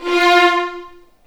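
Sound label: F4 violins; The Ultimate String Collection; East West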